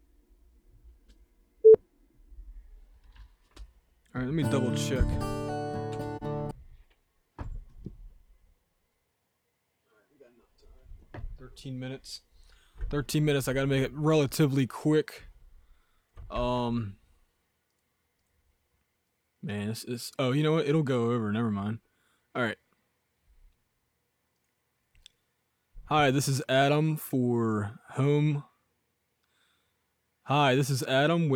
wavesh eq acoustic gtr

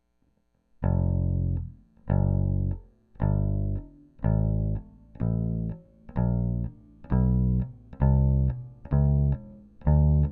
Bass DI Audient iD14; BassDIShootOut

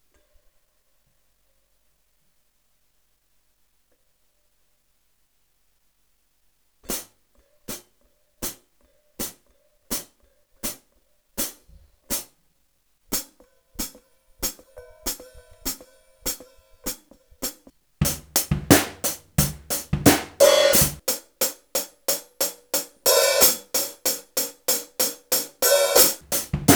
zildjian hihats shootout

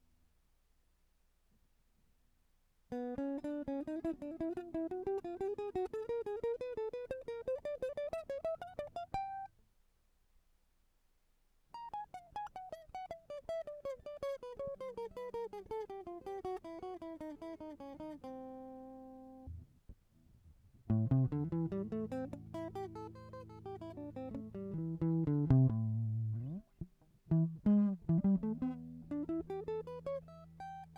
jackson bigamp; jacksonv

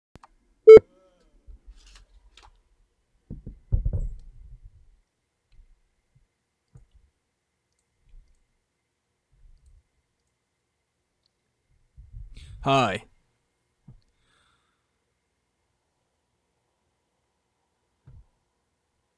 wavesh eq snare; Waves H-EQ - Snare Drum

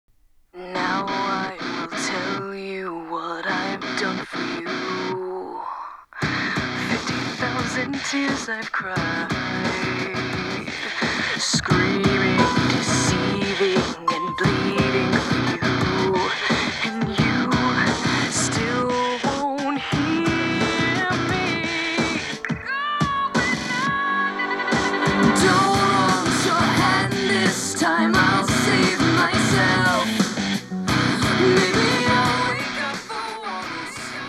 mic placement sm57 only; Microphone Placement; SM57 solo track

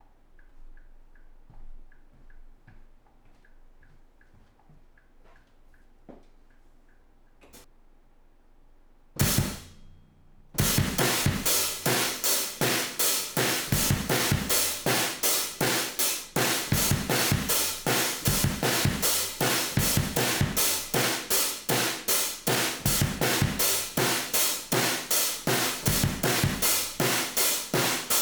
drum room compressor-A; slate-ik

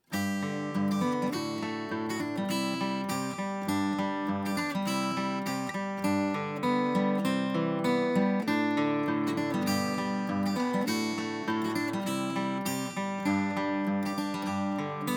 acoustic guitar SSL 4K EQ-B hpf; slate-ik

